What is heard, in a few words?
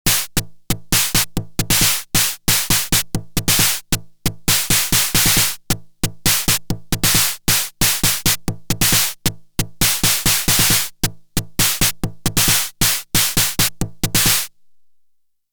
SNARE CV Decay